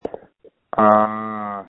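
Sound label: Scream, phone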